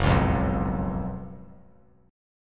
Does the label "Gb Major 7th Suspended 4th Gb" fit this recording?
Yes